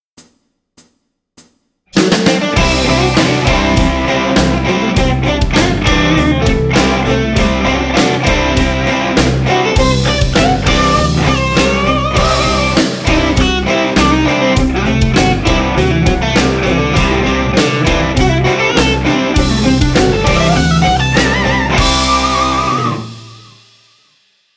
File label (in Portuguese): Guitarra Penta Am6; Dicas de Guitarra